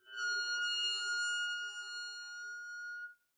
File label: arco noise harmonics, double-bass